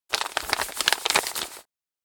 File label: Thin paper handling